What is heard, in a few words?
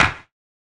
Perc, Percussionz